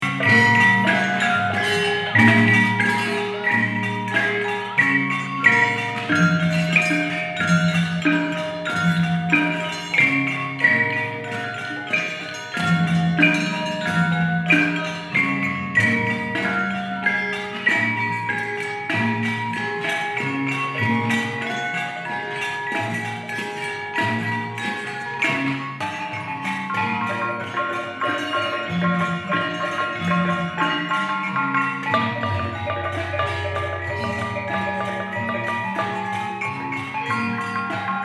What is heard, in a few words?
Gamelan audio